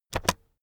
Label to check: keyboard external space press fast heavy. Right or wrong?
Right